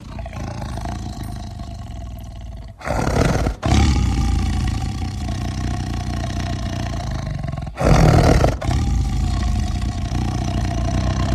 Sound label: Tiger Grrrr Growl; big cats